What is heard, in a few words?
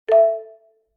new Chat; dispatch